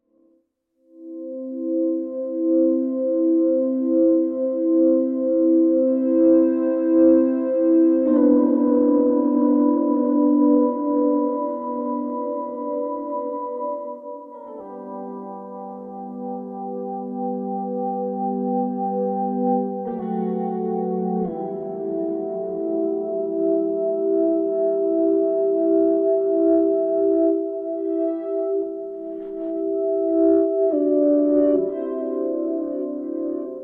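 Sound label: Ebow Guitar Dusk Stereo Mix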